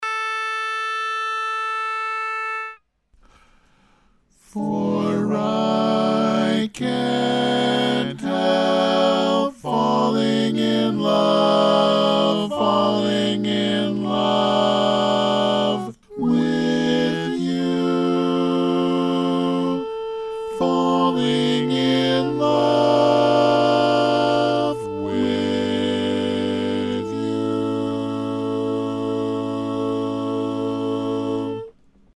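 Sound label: Baritone, barbershop tags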